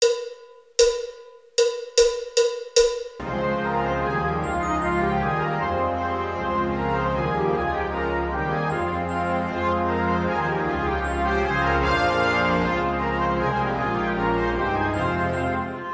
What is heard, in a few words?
Spanish Dance